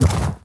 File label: inv unequip; inventory